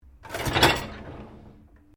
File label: Dishwasher Bottom Tray Loaded Open, Household dishwasher; Dishlex; Appliance; Appliances